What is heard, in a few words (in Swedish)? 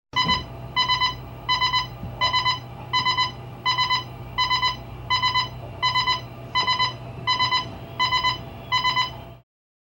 Ljud låg ton pulserande trippel